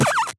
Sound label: fart